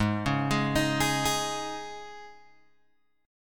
G sharp Suspended 4th G sharp, Guitar Open G

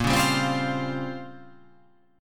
A sharp Minor 6th Add 9th A sharp down, chord

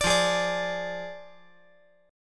F Minor 6th F down

